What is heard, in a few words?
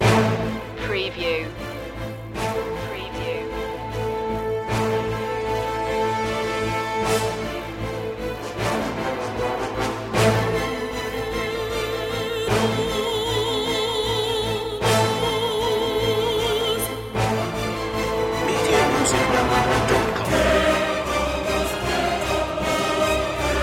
no perc